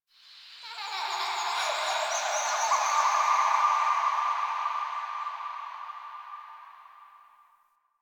Park Memory Echoes

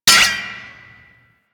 parry bullet; Warframe

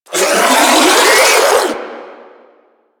Melee Mid Spin Scream

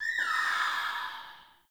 Cave Ocean Creature Far; Midrath Island

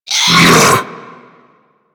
Infested Diseased Ancient Pain; Warframe

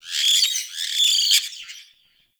Bird Alerted Caique Call; Midrath Island; Wildlife

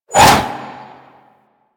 Arch Wing Sword Short Whoosh; Warframe; Weapons

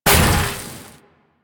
Laser Impact; Warframe; Weapons; Corpus; Crewman Laser

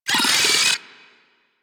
Arachnoid Micro Vocal Attack; Warframe; Corpus

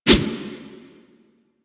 Wolf Swipe; Animals; Warframe; Quests; Duviri

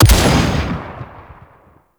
dropship turret shot; Grineer; Warframe; Tau

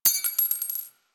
Belt Fed Rifle Links; Weapons; Warframe; Tenno; Frumentarius

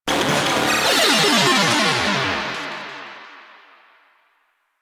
Arcade Fail; Warframe; Nineteeen Ninety Nine; Ninety Nine Snake Game

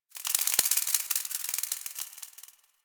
Foliage Insect; Warframe; Duviri

